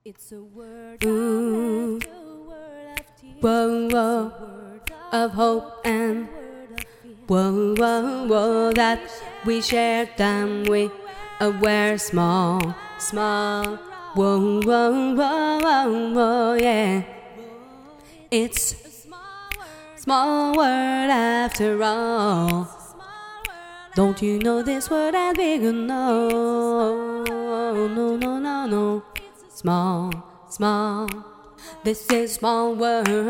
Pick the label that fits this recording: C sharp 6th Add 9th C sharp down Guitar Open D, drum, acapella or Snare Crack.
acapella